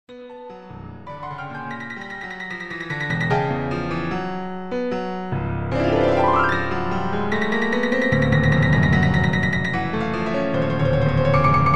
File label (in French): vite toujours plus fort piano